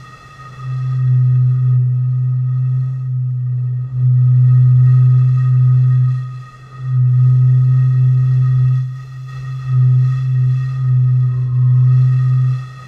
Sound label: Whistle Stone Champagne B Loop; Journey